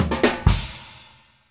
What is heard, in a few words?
FILL